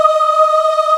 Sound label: Aahh Chor, VOICE; Best Service Gigapack 1